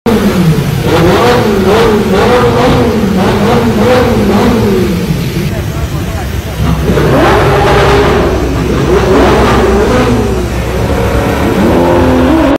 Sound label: Car Showroom; نمایشگاه ماشین